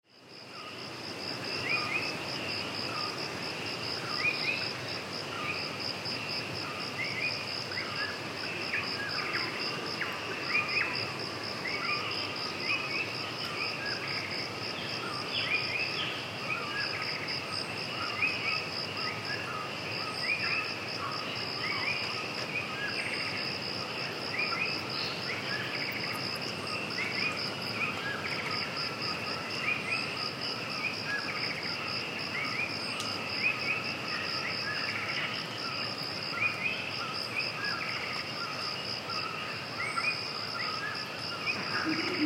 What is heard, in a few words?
Sinharaja; Rode XY; Sri Lanka Wildlife Sound Recording – The locations